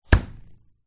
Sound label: foley tape stretched tight long pull release twang